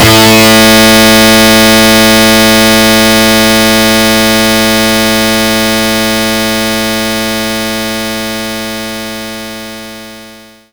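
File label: Distortion Guitar Low A2; Knights Try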